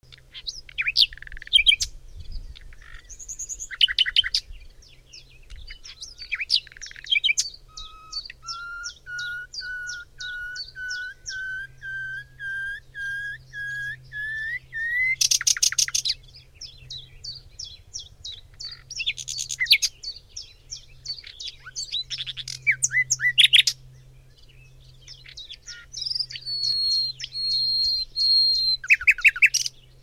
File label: atmo germany berlin nightingale